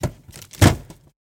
fridge drawer

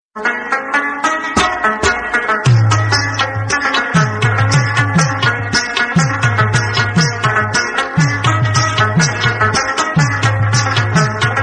Rabab Instrumental; رباب

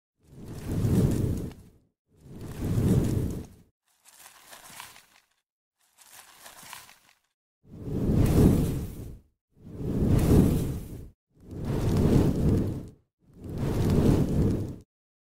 Fire Burning

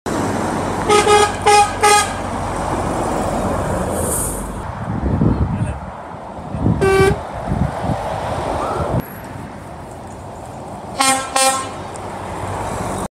Volvo 500 horn; ولوو ۵۰۰

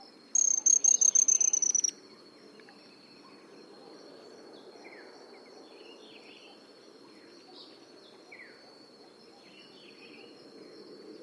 Ochraceous piculet

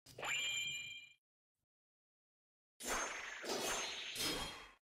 Katana; کاتانا

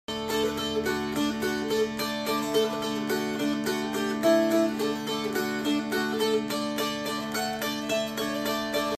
Appalachian dulcimer

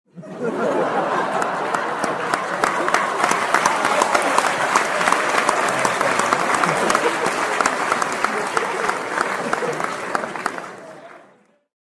people clapping and laughing; کف زدن و خندیدن مردم

